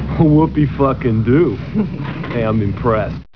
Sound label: woopty